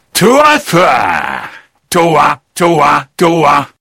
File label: sniper laugh long